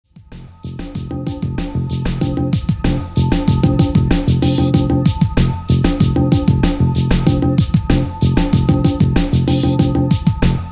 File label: japanese bells